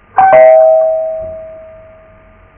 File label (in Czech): zvonek